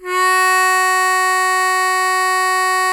HARMONICA F#3; Sonic Reality; American Heartland

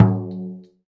frame, Misc Drums

